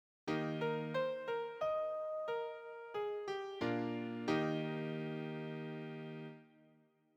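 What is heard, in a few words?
cadence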